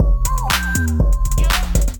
Digi Kit, Drums; Ableton Factory Packs; Beat Tools

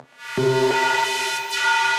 Ambient & Evolving; Questionable Nature

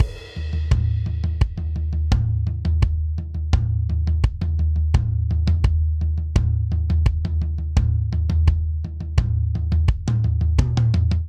Toms Pop Chorus 85 bpm; 4 4; MIDI Clips; Ableton; Factory Packs; Drum Booth

